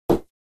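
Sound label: Badminton Shuttlecock Drop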